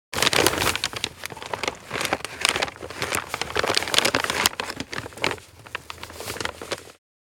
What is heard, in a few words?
Large paper bag handling